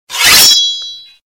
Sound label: Sword